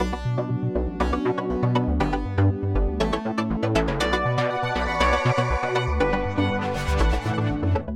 padsynth

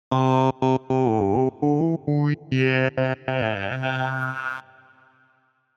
humonica legato

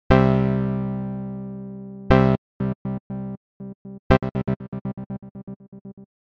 midi gate

